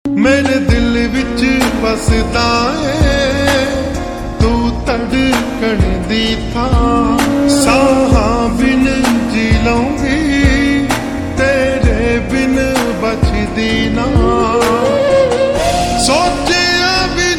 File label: Ringtone Slowed Reverb